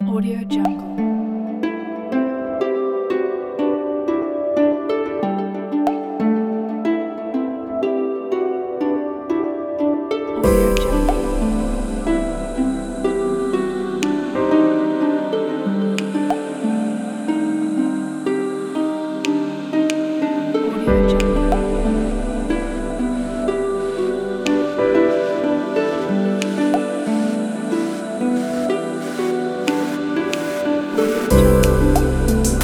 abstract ambient

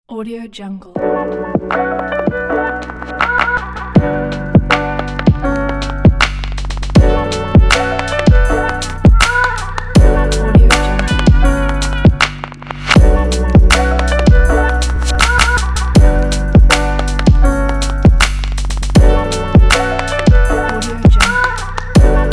jazz chill glitch hip hop

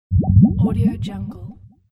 wobble sound

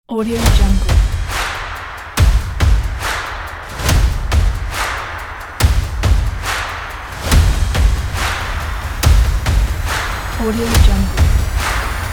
drum epic; stereo color

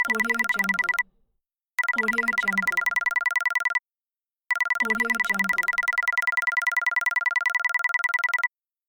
the digital counting

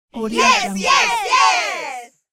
family success yes shout; fxprosound

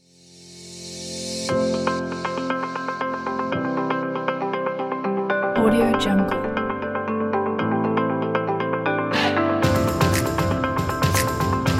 summer travel upbeat pop

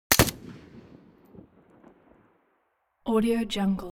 weapons rifle ak47 exterior fire single bolt

